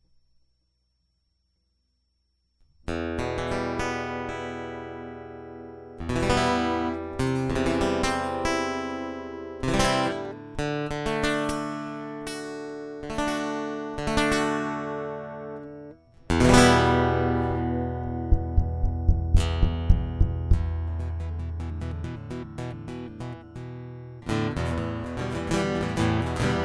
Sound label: Guitar Loop